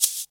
Royality free shaker hi hat tuned to the D# note. Loudest frequency: 8380Hz; percussion